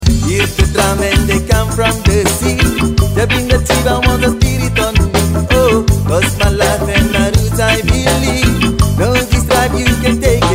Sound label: bg music